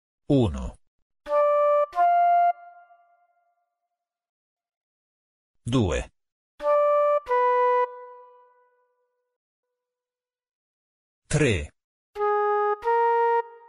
esercizio 7 coppie di suoni; Sonora vol A

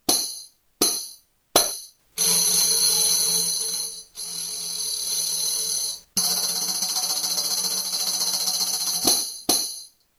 tamburello

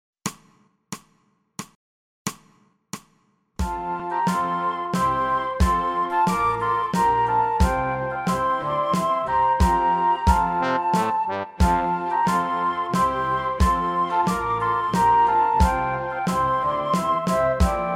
Inno Polonia; Sonora vol A

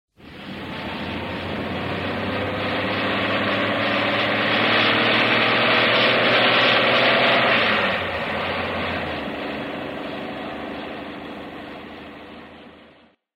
esercizio rumore

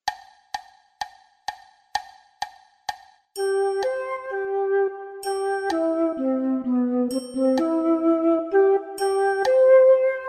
La scala di Do

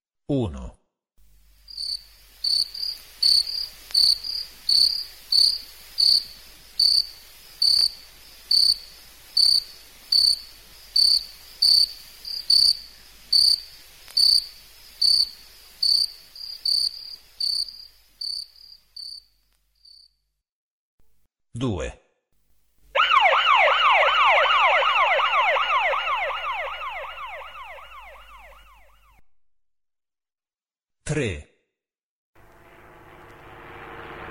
esercizio suoni lunghi corti; Sonora vol A

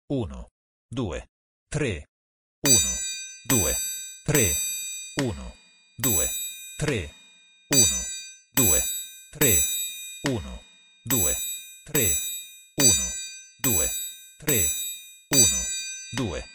Ternario; esercizio; Sonora vol A

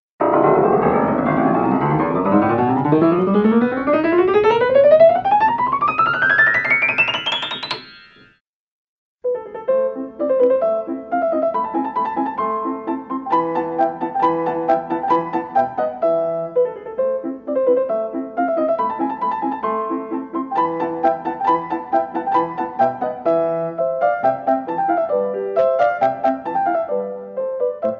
pianoforte verticale

